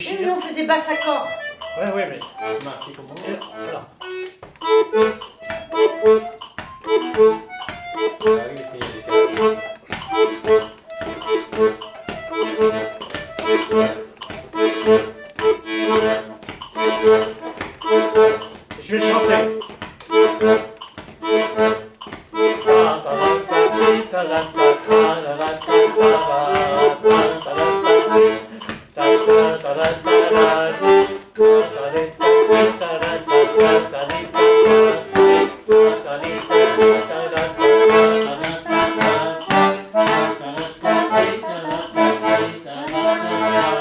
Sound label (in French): Basse Accord Training; BMarakech